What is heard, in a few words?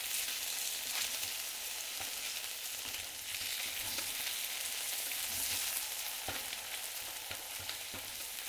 Fry Pan Meat; MiSide